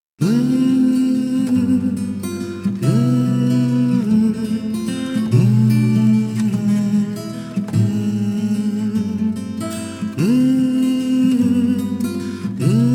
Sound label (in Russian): колыбельная